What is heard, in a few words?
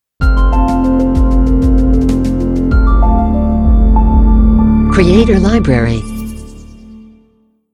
Ambient Logo Stinger; Logo-Idents